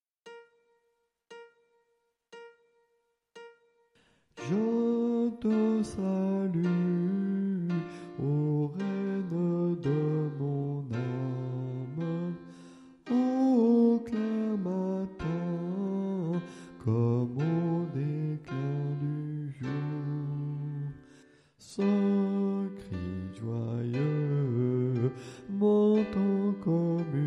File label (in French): basse